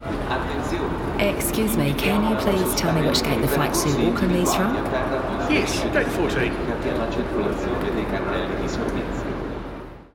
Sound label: Airport